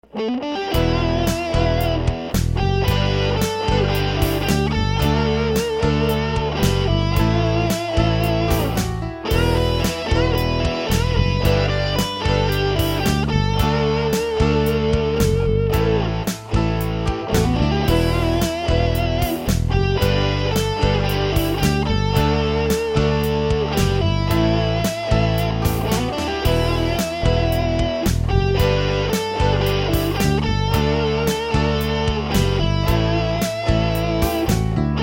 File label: Rock Guitar